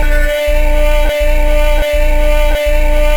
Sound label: SP TONE BAS; SP1200; E-MU Producer Series Vol. 8 – Platinum Phatt; PlatinumPhattCD1